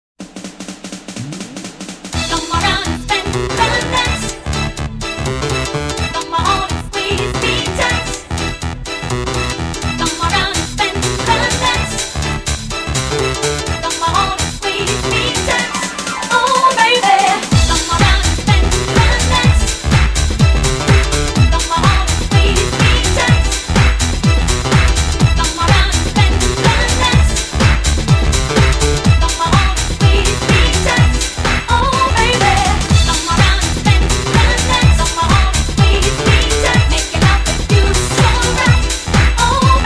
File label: Radio Version